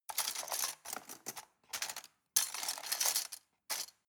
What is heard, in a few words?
cutlery plastic multiple drawer rattle search